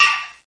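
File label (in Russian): негромкий звук бряцания чего то легкого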